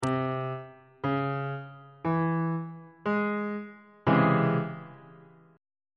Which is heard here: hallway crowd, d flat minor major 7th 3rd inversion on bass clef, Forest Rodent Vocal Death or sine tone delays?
d flat minor major 7th 3rd inversion on bass clef